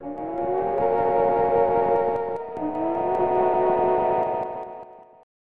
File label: Ghost's Whisper; porky pig s haunted holiday; snes